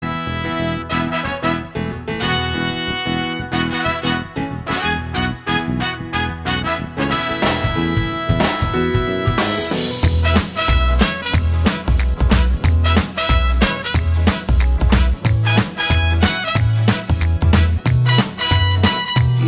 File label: Title Song Music Ringtone; telugu movie